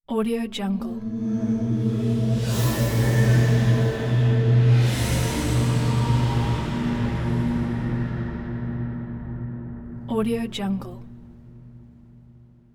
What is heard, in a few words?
airy deep celestial ambience